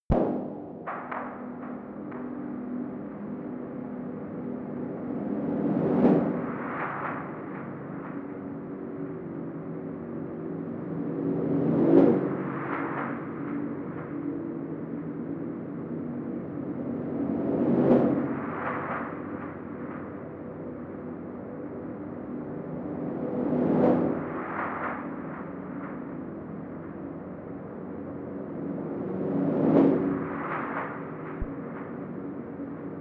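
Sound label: the noise of fire, music elements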